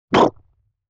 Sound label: Mouth Hit; Vintage Cartoon; Comedy Vocals